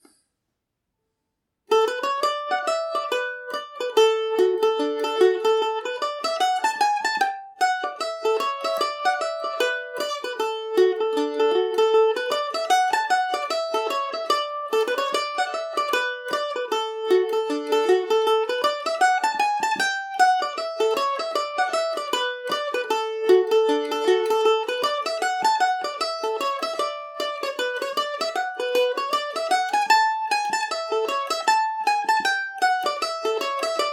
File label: miss susan cooper dmaj, mandolin